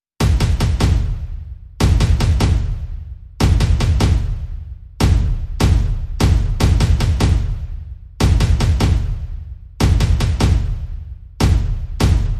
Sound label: Percussion Only